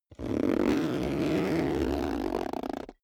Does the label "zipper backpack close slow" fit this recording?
Yes